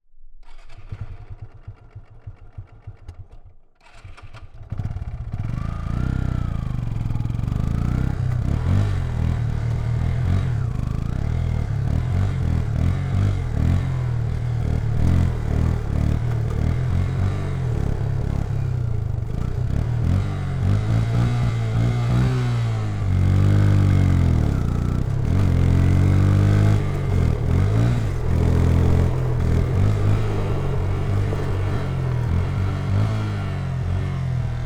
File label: Motorcycle - Yamaha Fazer - Riding - Fast Speed - Slow Down - Indian; The AudioVille; Motorcycles of India